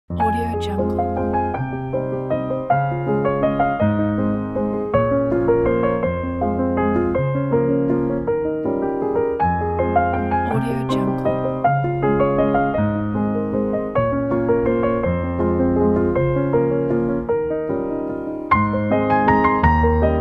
an elegant waltz

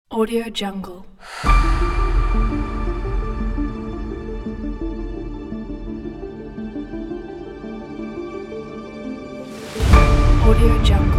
inspiring epic trailer

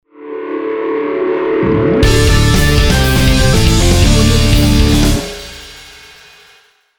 hard rock logo

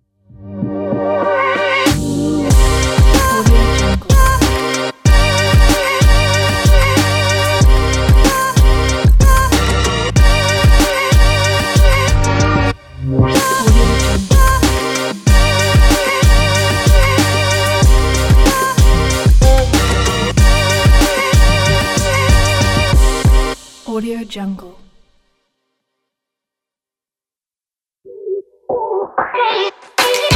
hiphop ident pack

